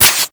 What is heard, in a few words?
Inflate; Peculiar Inflation Mod